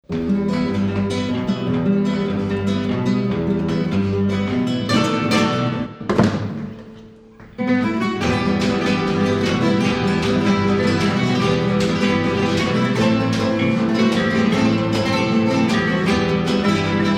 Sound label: rumba